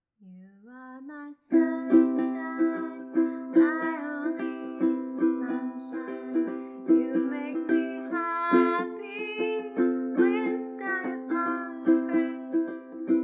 [singing] and [playing ukulele]